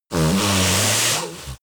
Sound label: yeti nose blow long